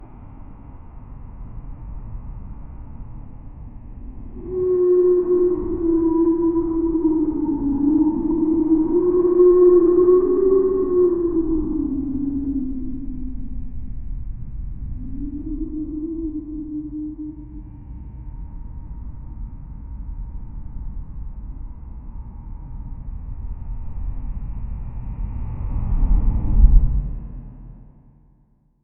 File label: monster deep echo; eagle